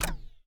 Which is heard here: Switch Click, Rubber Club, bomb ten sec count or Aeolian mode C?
Switch Click